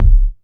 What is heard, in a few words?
KICKS